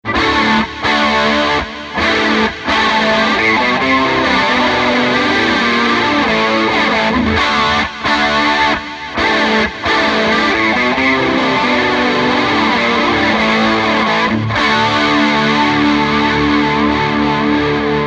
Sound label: retrosonic chorus